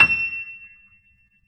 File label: E 6, Keyboards Acoustic, GRANDP ST; USB Soundscan vol.17